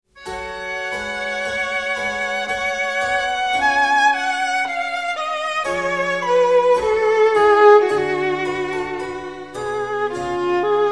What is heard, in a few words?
Violin Sonata In A; Handel; A大調第5號小提琴奏鳴曲; 第3樂章